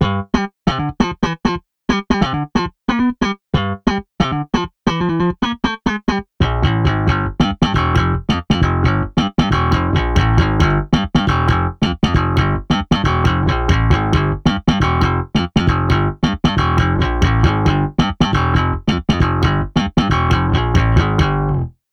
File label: slap ghost off drum off